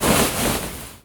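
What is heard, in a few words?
getting up; OKIAGARI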